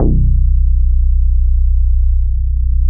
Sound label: QUICK BASS, Bass Analog & Synth, DISCO; USB Soundscan vol.12; AKAI